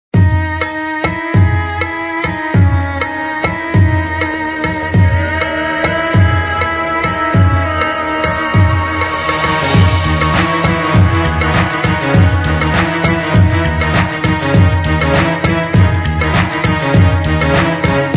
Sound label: sad romantic ringtone